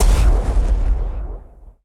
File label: explosion player